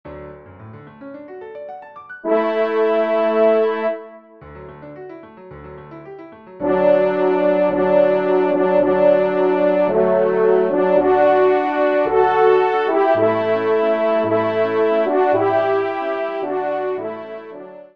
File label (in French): trompe duo; Introit; messe d'estival